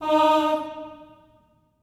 Staccato M D#4 L, Symphony of Voices; Spectrasonics

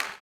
CLAP, Blues Soul; Spectrasonics Vocal Planet

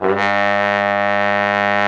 Trombone; Sound & Vision - Gigapack II CD 1

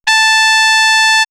Trumpet, horn section; X-Static Goldmine; backtothe70's

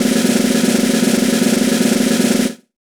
Concert Snr Roll; Roland BK-5; Snares

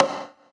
Percussion, House

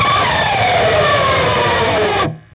PRS SCRAP, Guitars; PRS SCRAPES

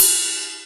Ride, Alesis Hr16b, Drums

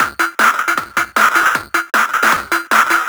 155 Dancehall Fx, Drum Loops; Zero-G; Soundclash Nu Dance Electro; Acidized Wavs; 155bpm; Dancehall Fix

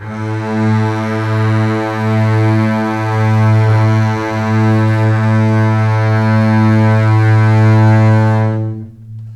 A2 LEG F, String; Ultimate String Collection

